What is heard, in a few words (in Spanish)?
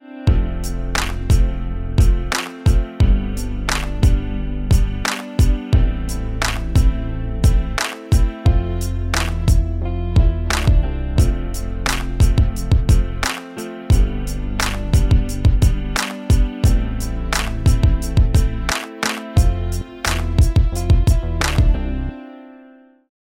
instrumental; WIKIPEDIA; radio3 raportajes